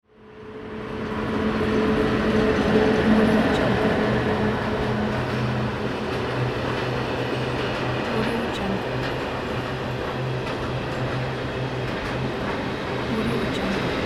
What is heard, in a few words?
train